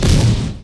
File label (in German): RAKETE; Best Service; Advanced Mega Effects